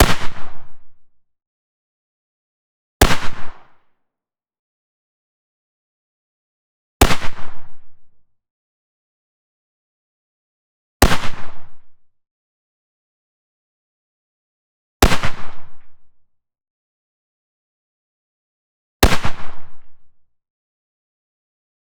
Barrett M98B .338 Lapua right Single shots; 2m; MKH60

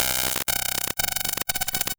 Duo Sweeper; Navi Retlav Studio; Impulse Exploration 4

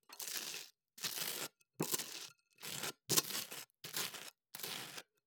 Toast, Butter, Spread, Knife; UberDuo; The Kitchen Audio Play Set

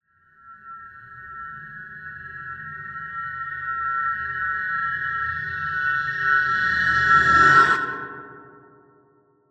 Drone Atmosphere Transition; DRONES; MatiasMacSD; THE ZONE DRONES

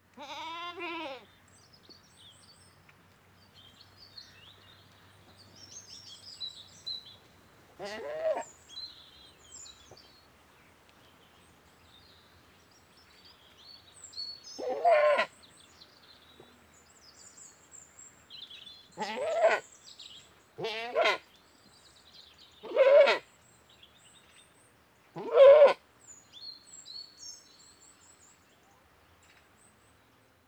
One British Saanen Goat Bleating; Livestock; BBC Sound Effects Library

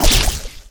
SPLAT Crush; GameBurp; 2000 Game SFX

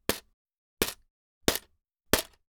Nail case hits; Matt Sherman; Bed O Nailz; Gift Of Junk v1

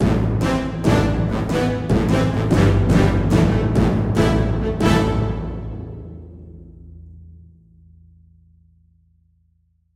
Signature Sound; FXHome; Signature Tracks

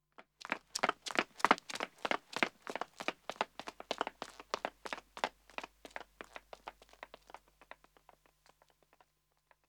Pavement Running 1 man & 1 wo; Footsteps; BBC Sound Effects Library

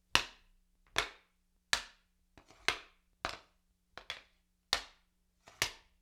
Spear Sabre Haft on Hilt; StillNorthMedia

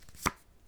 card Slide, Casino; Kenney's Sound Pack

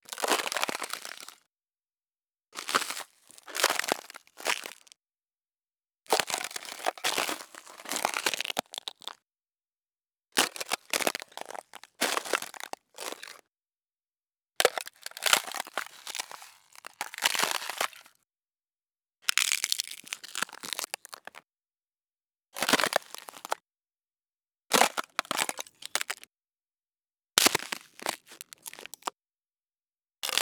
thin ice cracks crunchy; Mindful Audio; Elements - Ice

